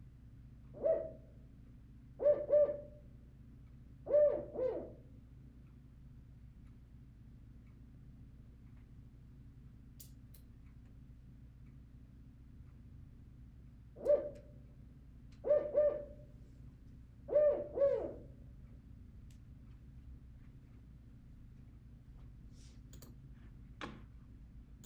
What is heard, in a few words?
Spotted Owl Call